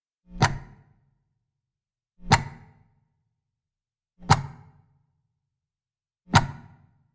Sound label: plug out